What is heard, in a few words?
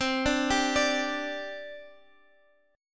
D 7th Suspended 4th, chord